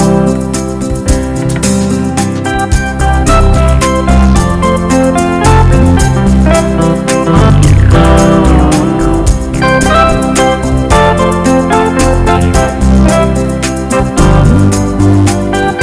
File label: A Happy Ballad